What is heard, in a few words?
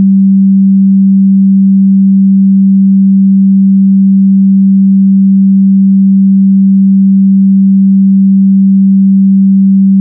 beats; KundtTube